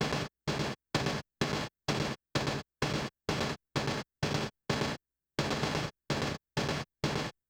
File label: mid DDL perc